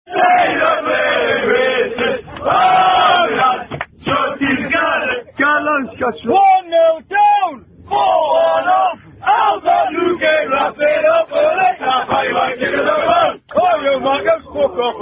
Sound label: Sing Song On The Bus, Two Football Chants